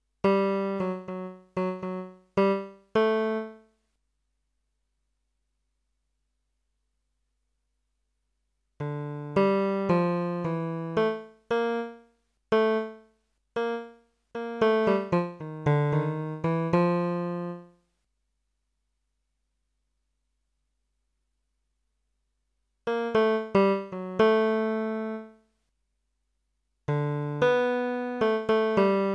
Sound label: damer bas